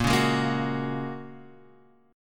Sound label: A sharp Minor 7th Sharp 5th, down